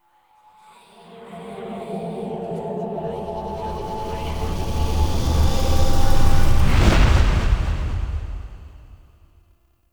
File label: Cave Envy Intro Stinger; Warframe; Duviri